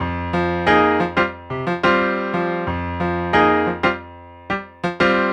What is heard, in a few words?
090 NuJazz Piano Fmaj, Grand Piano; Digidesign; Pro Tools Essential Loops